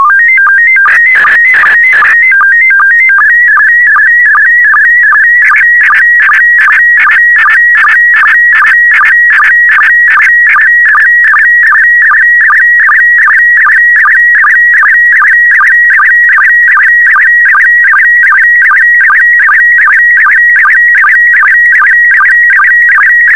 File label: sstv; VK4MSL